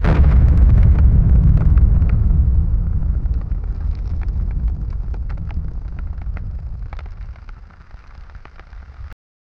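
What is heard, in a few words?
avalanche interior massive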